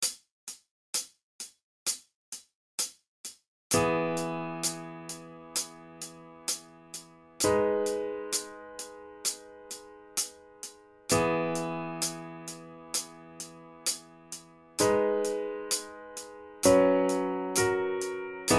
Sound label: Piano click